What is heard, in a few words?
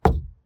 wood hit